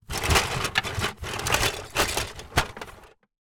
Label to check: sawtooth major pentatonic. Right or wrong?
Wrong